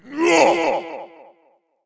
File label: warcry; cstrike; d2lod